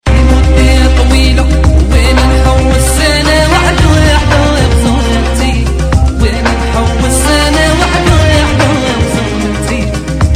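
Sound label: desert arabic ringtone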